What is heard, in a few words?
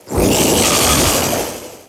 haunt death